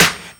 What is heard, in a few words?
SD, Dance Drums